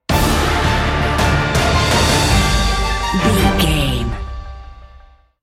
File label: hero cinematic trailer sting bumper